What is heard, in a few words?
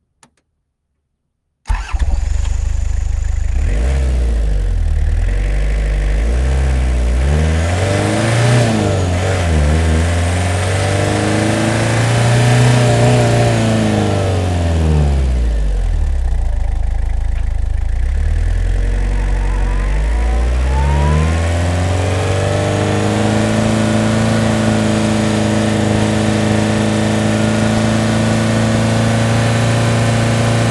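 toyota land cruiser onboard start fast drive stop reverse stop drive horn x2 stop off engine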